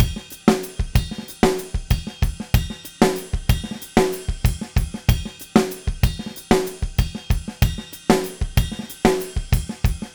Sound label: Heart Samba, Latin Percussion, Drumset; Sonic Foundry (Sony Creative Software); Joe Vitale Latin Percussion